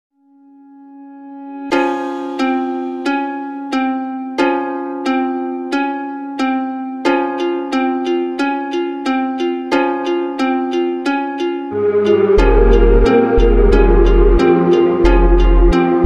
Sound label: trap mix ringtone